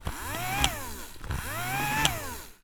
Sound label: industrial motor machine small plastic whirr short off x2